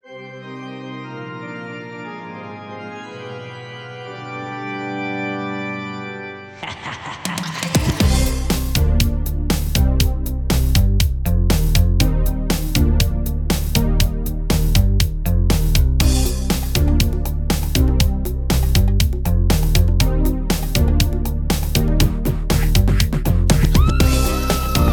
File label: (Spooky Mix) (Instrumental)